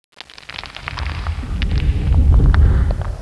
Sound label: monolith falling